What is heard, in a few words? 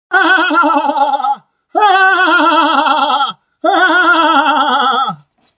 laugh